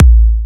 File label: Punchy Kick